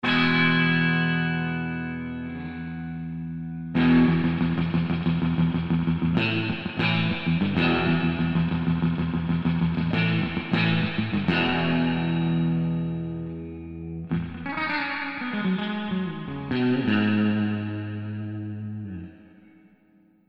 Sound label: FENDER SS Medium